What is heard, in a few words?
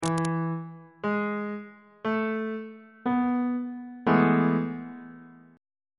a major 7th suspended 2nd 2nd inversion on bass clef